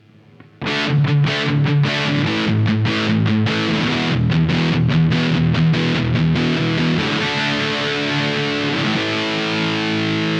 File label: Higain Preamp; preamplis en rack; Preamp 2